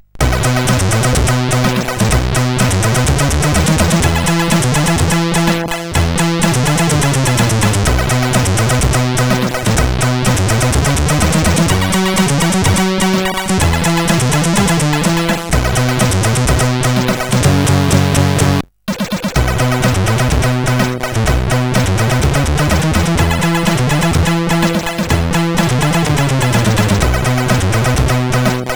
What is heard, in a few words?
Rock n Roll, sid CSG8580R5